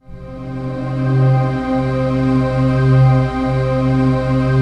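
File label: trance pad; Best Service; XX Large - Pads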